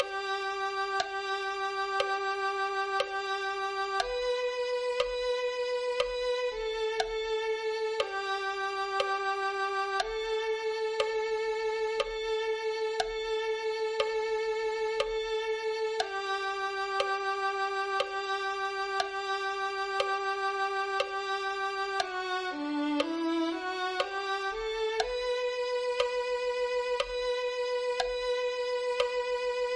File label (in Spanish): violin2